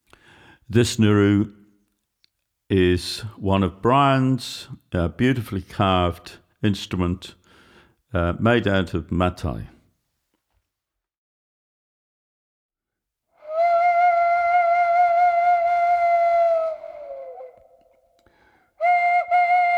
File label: Nguru Matai